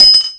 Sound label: Silver Dollar Drop; Ruckus